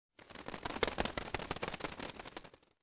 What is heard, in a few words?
pigeons; WMBonusXP